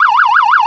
yelp, sirens; sq zone

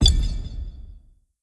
Boss Clear; blue archive

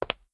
Foot Step; blue archive

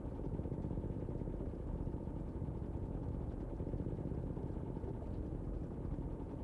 BG Machinery; blue archive

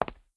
Foot Step; blue archive

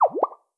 Emoticon Motion Think; blue archive

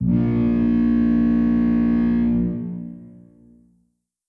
Horn; blue archive

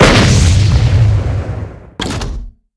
Heavy Tank Cannon; blue archive

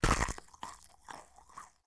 Eat; blue archive

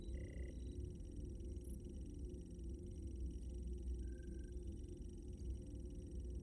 Computer; blue archive

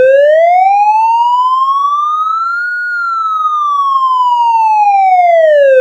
wail, sirens; code3 sprinter

